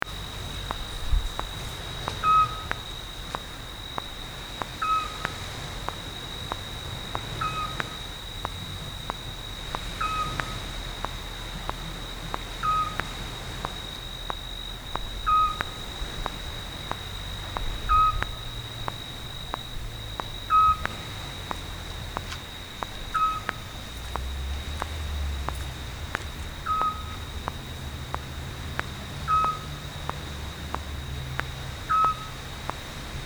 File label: with Click